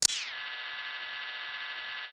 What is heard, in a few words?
Hawkeye Zoom Out